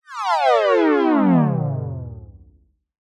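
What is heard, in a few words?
cartoon descend fall elastic; zapsplat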